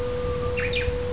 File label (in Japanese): uguisu